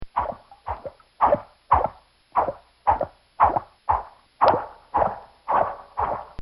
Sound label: Cartoon Sound Effects Plops